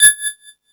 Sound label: Multi FX, Drum Machines, E-MU Pro-Cussion